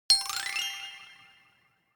ui notification glockenspiel sparkle slide up hard